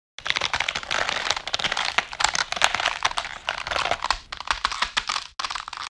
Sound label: ice breaking